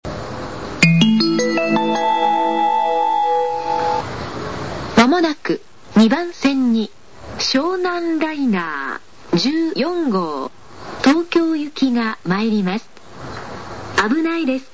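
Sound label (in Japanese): tsujido sekkin, approach melody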